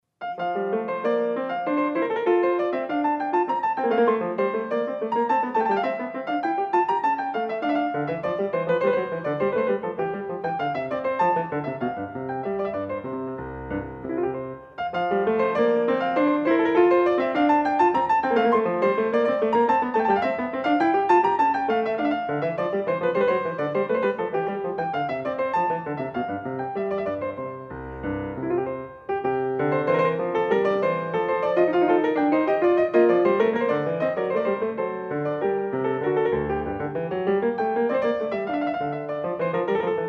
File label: English Suite in F major, Courante; English Suite No 4; BWV 809; Bach